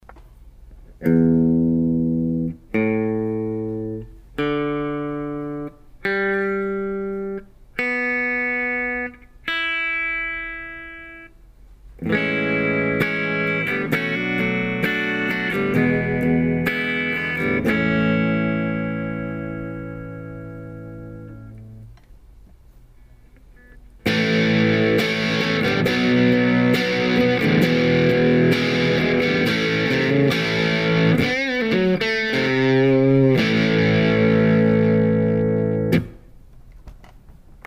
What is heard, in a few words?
electric sample